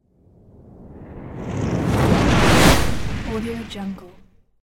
fireball whoosh; frontiersoundfx

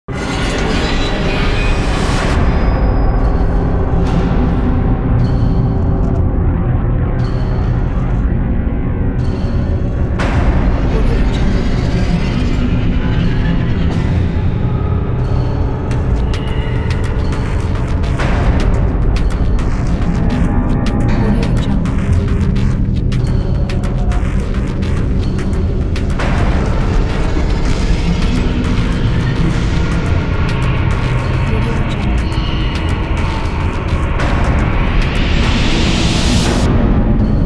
horror trailer intro

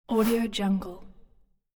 swoosh; minimal studio

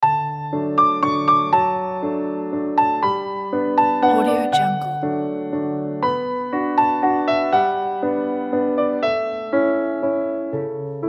uplifting tango

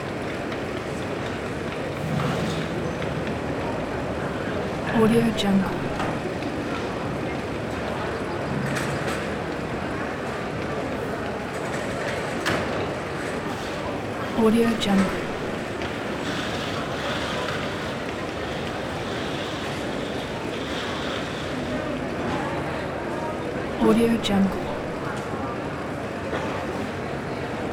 market background ambience

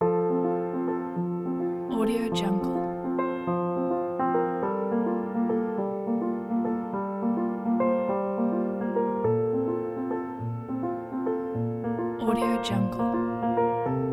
uplifting romantic

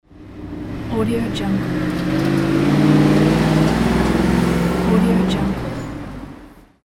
car

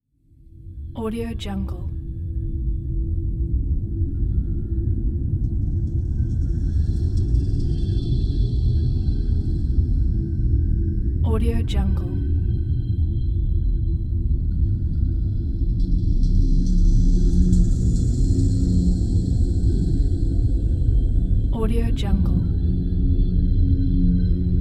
space ambience

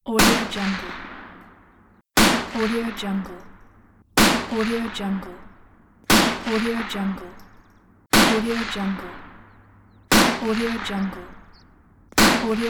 rifle shot pack

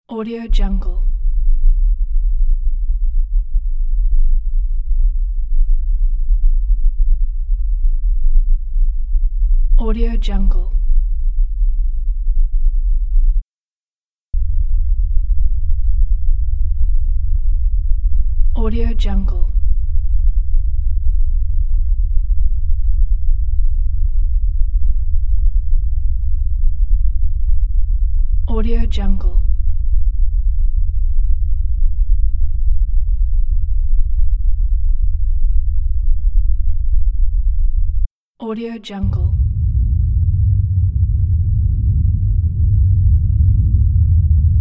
bass rumble loops pack

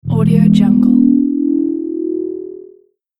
tone future

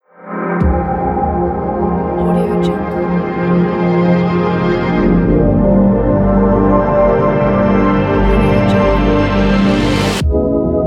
the bright logo; boomopera-sfx

